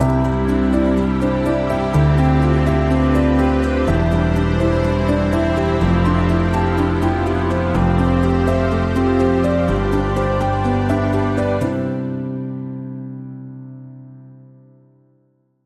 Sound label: Bumper